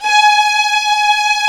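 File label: violins, fff; Roland - String Master Series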